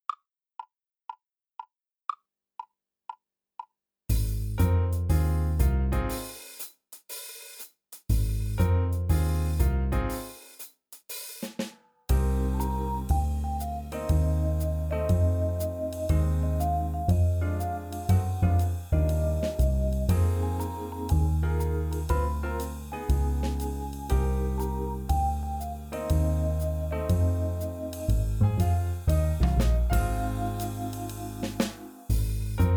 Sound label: with drums; Drum Kit exam